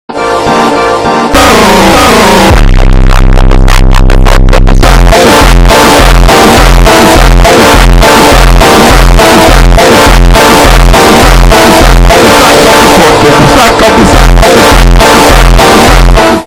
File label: bass boosted funk